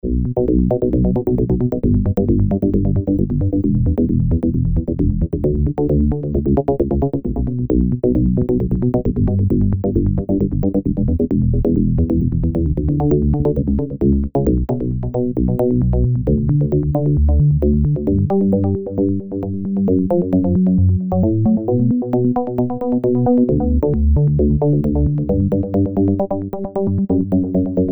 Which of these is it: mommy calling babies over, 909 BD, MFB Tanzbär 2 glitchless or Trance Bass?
Trance Bass